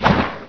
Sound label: FIREBALL; Hexen II; imp